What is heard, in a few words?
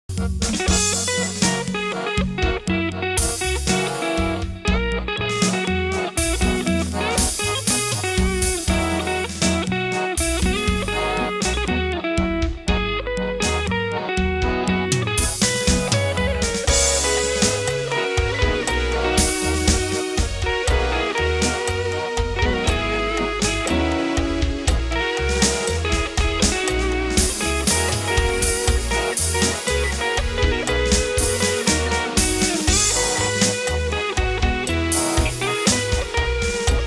instrumental